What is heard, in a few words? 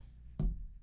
Clay On Table